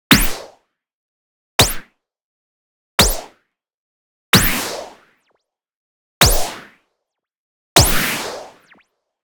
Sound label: IMPACT WHITE NOISE Power Punch; boom library; Anime Essentials